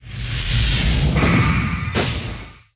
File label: Witch Attack